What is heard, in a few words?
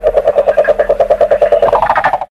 Frogs Coachar